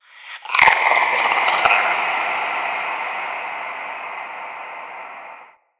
Small Creature; Ambience; Under City

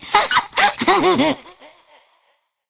Girl Idle E; Warframe; Zariman; Skitter Girl

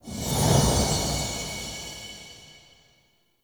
Egg Whoosh End; Edens Ultimatum; Ayatan

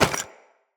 Loot Bag Drop

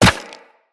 Run Bone, Footsteps; Characters; Operator

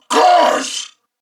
Surprised Corpus Venus Male, en; Dialog; Barks; Battle; Warframe; CorpusVenusMaleB

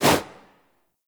Generic Weapon Light Aim Transition In; Warframe

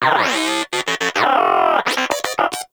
Inquisitive Vocal Studious; Pets; Warframe; Moa

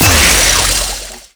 Blast Cast; Warframe; Captain Vor; Abilities Redux

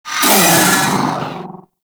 Vocal Pain; Warframe; The New War; Archon; Nira

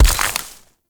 Mech Step Surface Foliage; Vehicles; Step Surfaces; Warframe; Nechro Mech

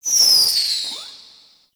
Projectile; Technorot; Warframe; Infested Lich

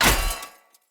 Sphere Polearm Medium Impact Metal

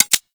Xbow Mech; Tenno

